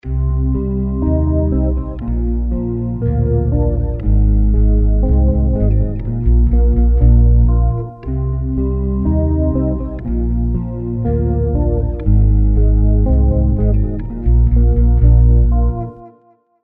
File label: Untitled Ballad